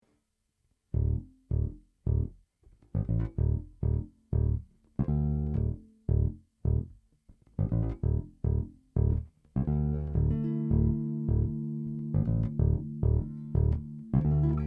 groove chord voicing

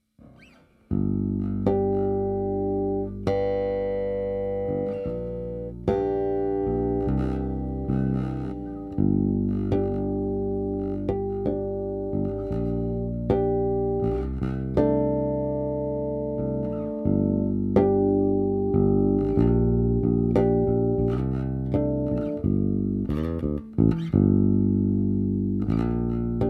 harmonics long

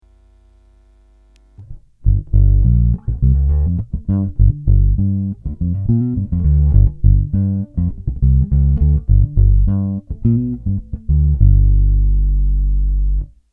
Neck pickup; basso a quattro corde, ispirato ai canoni classici del Jazz Bass

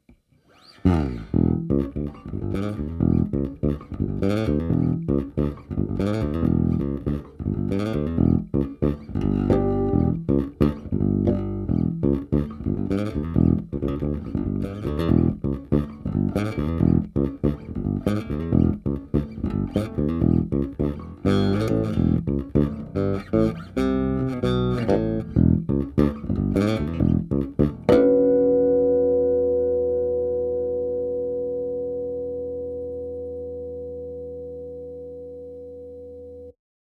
lil groove

